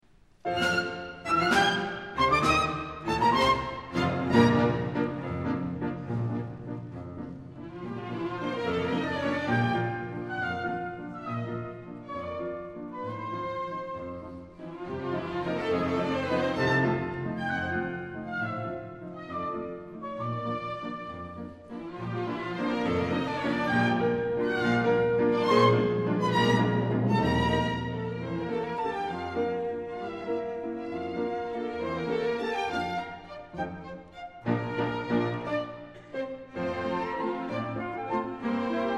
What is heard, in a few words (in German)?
damenkapelle strauss